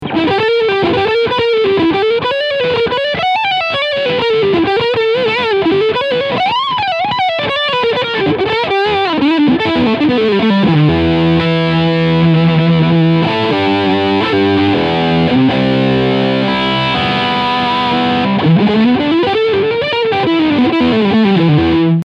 Leadsound Steg

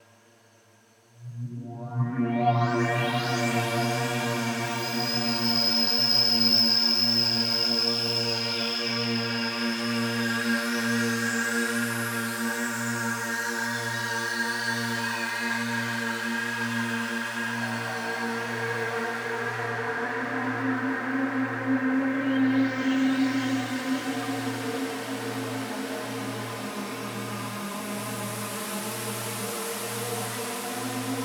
Mooer R7 X2 D7 X2 Synthie

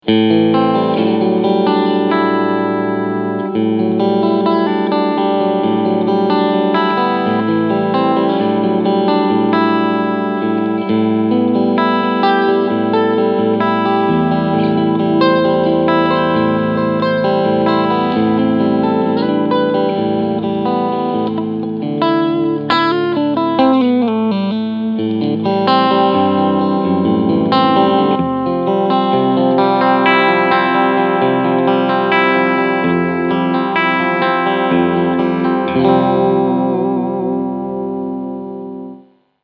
Cleansound Mitte Steg

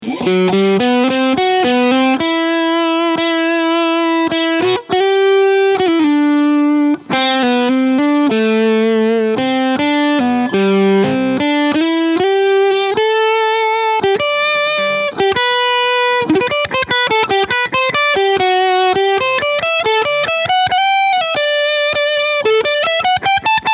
Overdrive Mitte Steg

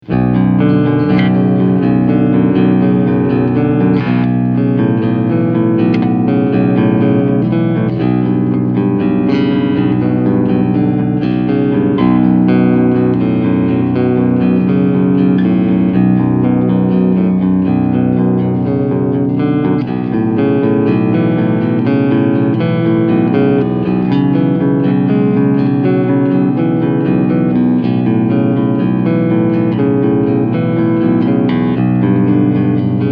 Clean Picking beide PUs SC

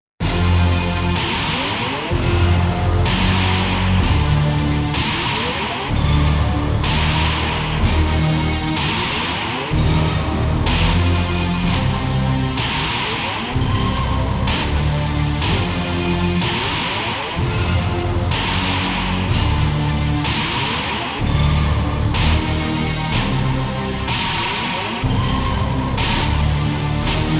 KORG wavestate Monster March Werksound Stereo Out

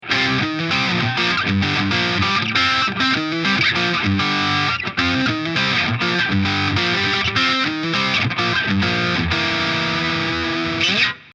Solar Guitars GC1.6 Steg parallele Spulen; Killertone

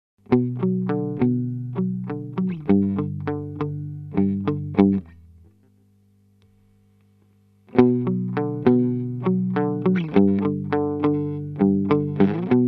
Keeley Compressor Plus weniger Kompression

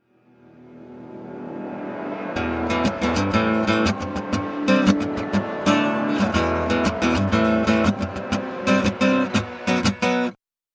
Voraushall

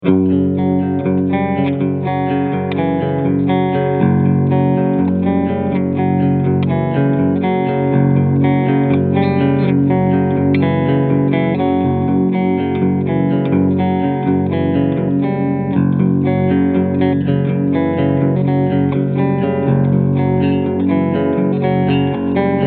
Schecter C 8 Multiscale Cleansound beide Humbucker SC Mode

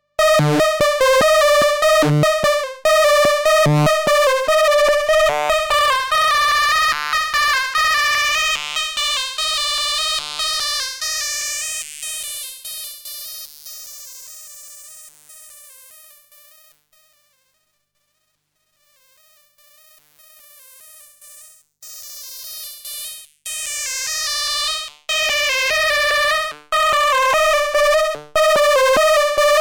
Klangbeispiel Lamda Post Cut

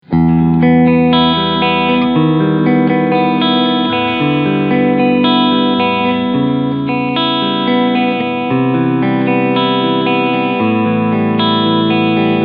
Cleansound beide EMGs

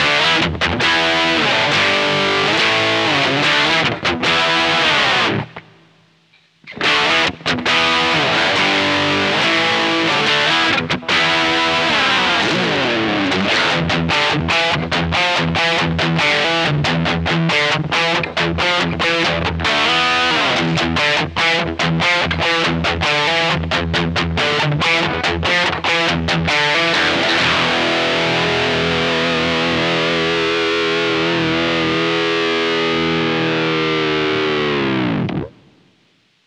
Electro Harmonix Lizard Queen Mittelstellung Bridge Pickup

